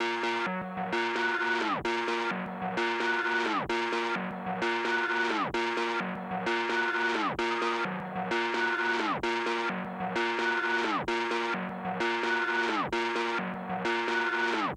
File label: Arp Dist